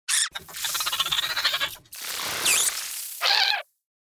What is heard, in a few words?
Black Rat